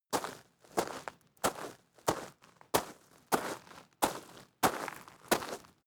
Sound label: step gravel sequence